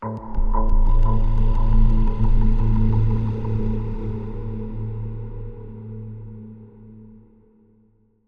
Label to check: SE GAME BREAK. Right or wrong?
Wrong